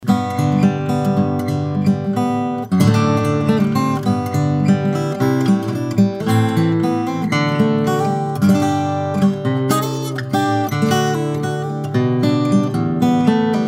Guitar sample 32b, Vintage vs Vintage style contemporary